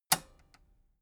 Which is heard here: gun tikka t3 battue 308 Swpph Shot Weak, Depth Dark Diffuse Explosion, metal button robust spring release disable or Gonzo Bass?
metal button robust spring release disable